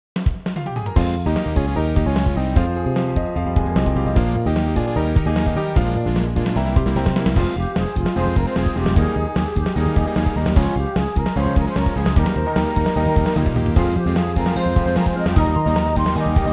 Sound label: RPG battle